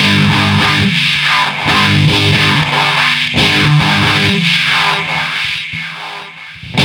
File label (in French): Guitare